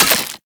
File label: Melee Impact Glass; Warframe; Weapons